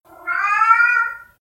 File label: cat; animals